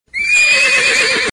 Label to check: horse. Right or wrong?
Right